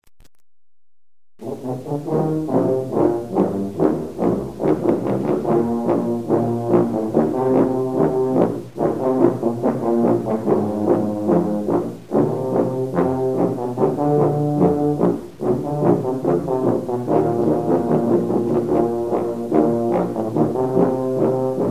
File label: Sousaphone; INSTRUMENTOS MUSICAIS